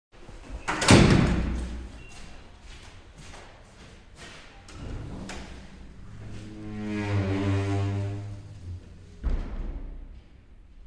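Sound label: sounds of apartment entrance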